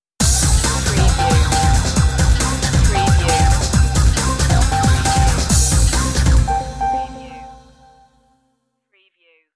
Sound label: hot club underground beat stinger